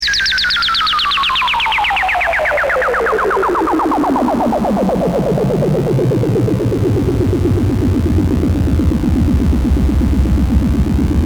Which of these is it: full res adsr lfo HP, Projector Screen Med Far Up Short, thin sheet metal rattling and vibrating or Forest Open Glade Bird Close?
full res adsr lfo HP